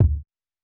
Not Nice Kicks

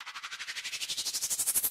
Rise

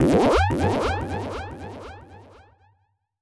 TRAP TRANSITIONZ

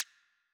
perc

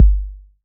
808's

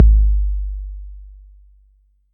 808's

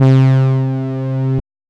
Synth

